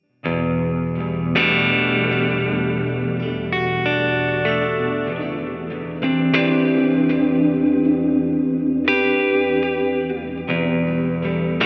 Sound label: Spherical Clean; Small Session Board